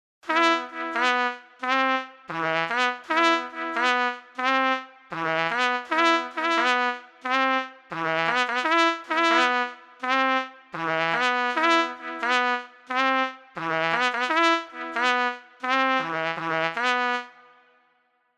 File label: brass trumpet; chiqui audio